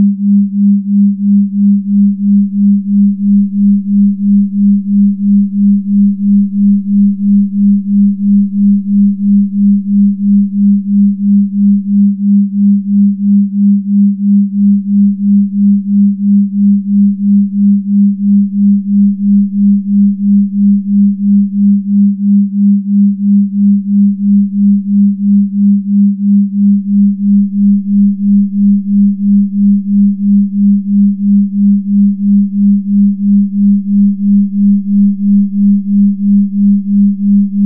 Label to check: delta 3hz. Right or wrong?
Right